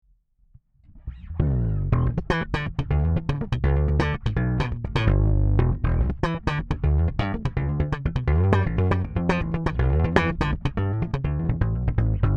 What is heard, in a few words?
Glowplug P Bass Slap Dist